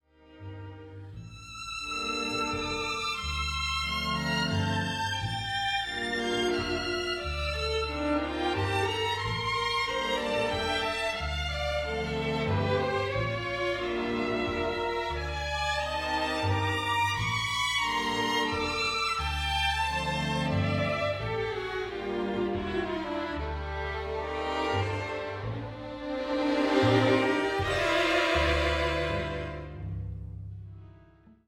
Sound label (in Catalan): Orquestra Corda; Concertino 1+13